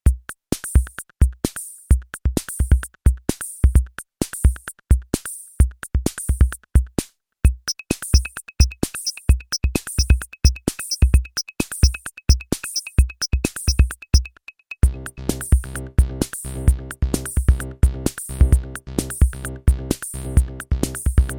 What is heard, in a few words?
groove; Rack Attack